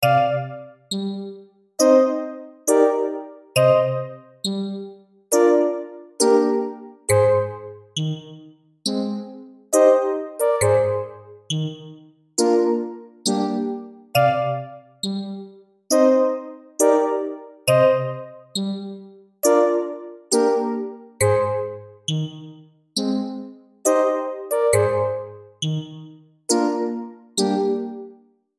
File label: med ambience